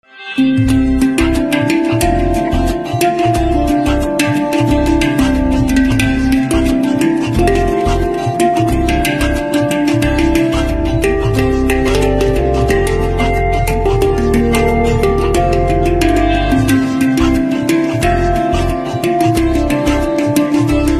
Handpan Instrumental Ringtone

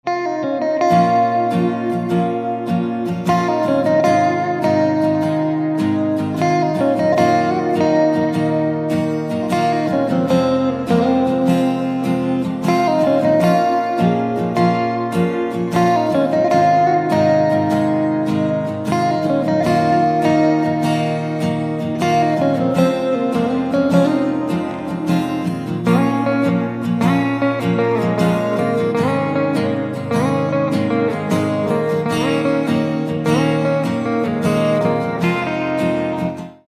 Guitar Instrumental Ringtone

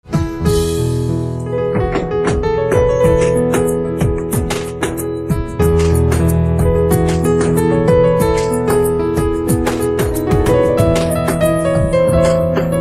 Piano Instrumental Ringtone

